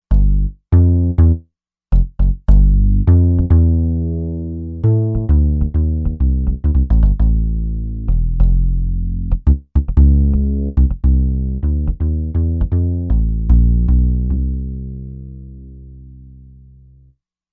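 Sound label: Bass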